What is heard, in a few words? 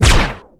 rocket; Gotham City Speed; riddler